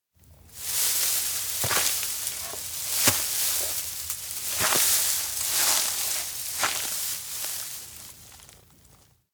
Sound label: steps outdoor wheatfield part walk; anemoi